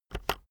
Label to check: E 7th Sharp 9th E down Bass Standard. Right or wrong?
Wrong